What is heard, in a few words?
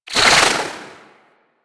Bow Whizz By, Weapons; Warframe; Corpus